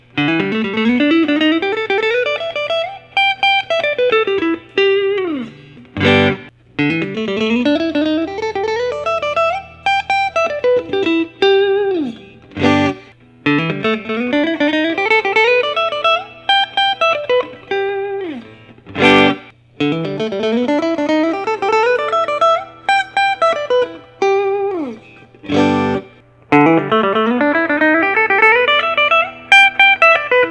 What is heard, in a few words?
suhr classic pro; lake placid blue